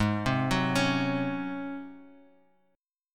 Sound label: Ab 11th down Guitar Half Step Down